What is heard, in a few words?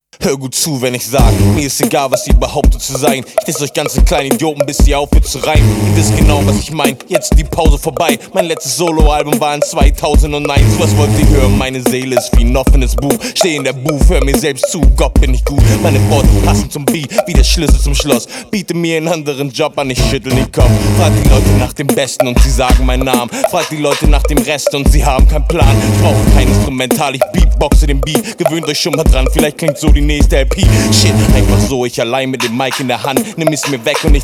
Beatbox